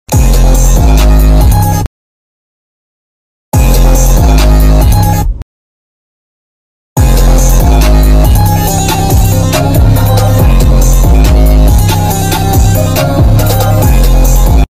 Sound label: ahh beat